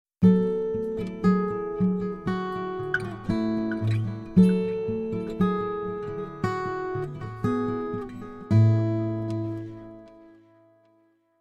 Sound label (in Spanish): LOOP 1 - ACUSTICA SOLA